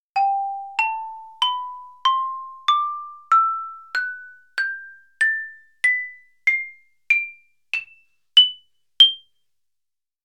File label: hill xylophone; kopec